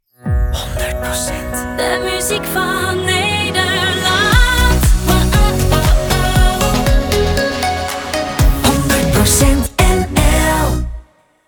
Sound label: Piano Forte